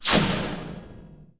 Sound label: laser; ImpGal2; spacewar weapons